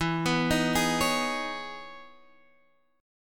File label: E Major 13th E; 12,14,13,13,14,x; arpeggiated